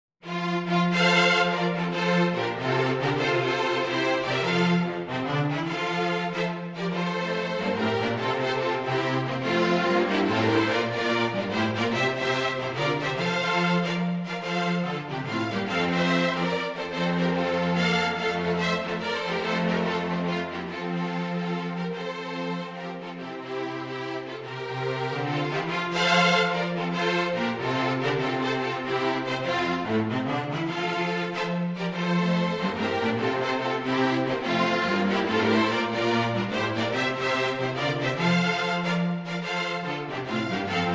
Joy To The World jazz string

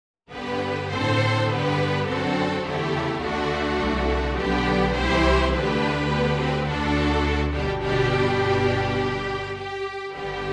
Trad String Orch, string orchestra or quintet; O Come all ye faithful

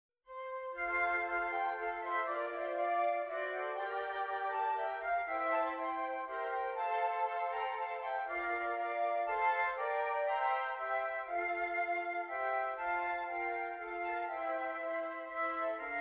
Flute Quartet; We Wish You a merry christmas

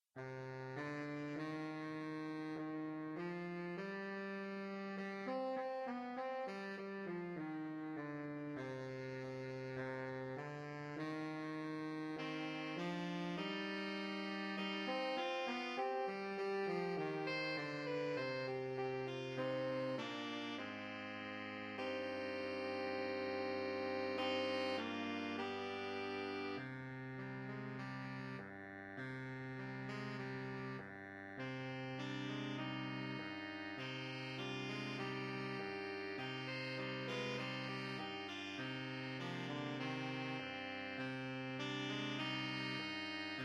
sax quartet; saxophone quartet

